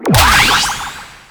Teleport End; Warframe; Captain Vor

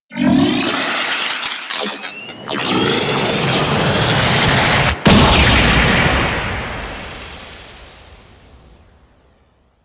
Tenno Push Back; Warframe; Tutorial

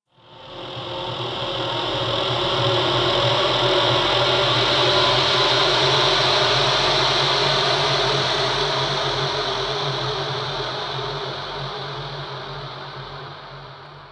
Exterior Ominous Void Weave, Ambience; Warframe; Rail Jack

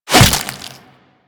Desert Skate Barb Hit Flesh B; Warframe; Attacks; Hit Effects

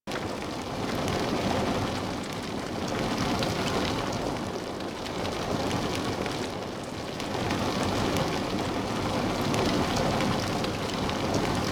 Ceiling Fire Brazier Loop; Warframe; Clan Dojo; Dojo Props; Dojo Dagath

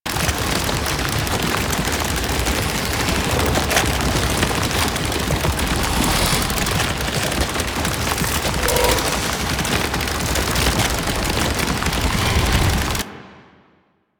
Tank Infested Move Loop; Enemies; Movement; Warframe; Lasrian

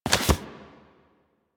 Investigate Putdown Folder; Warframe; Cephalon Weave

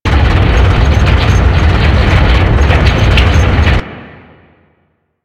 Big Door Motion; Warframe; Doors

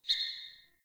Sparrow Death State Single Chirp; Animals

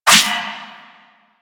Shadow Melee Swipe; Warframe; Duviri

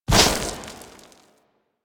Ram Movement Start; Animals; DE; Warframe; Quests; Duviri

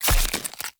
Claw Small Impact Flesh; Weapon Impacts; Enemy; Unarmed

